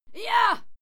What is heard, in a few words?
female fight scream yah; schreie; menschen